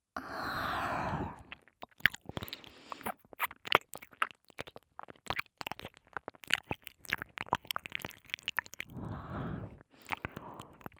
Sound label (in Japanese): 耳舐めサウンド